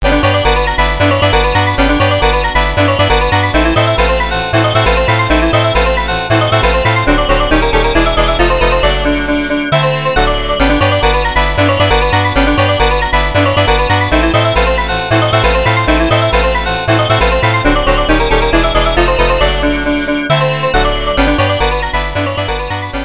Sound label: Power Up; The Fairyland Story x68000